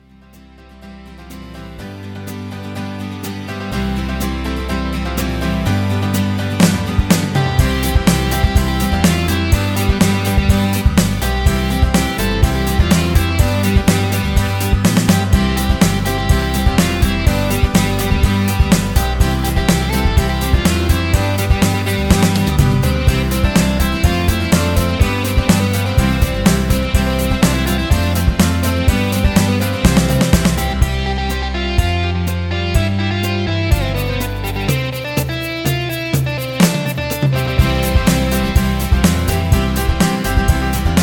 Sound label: Electric Band Low Key